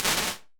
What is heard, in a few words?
Brick Wall, impulse responses, small spaces, outdoor spaces; Apple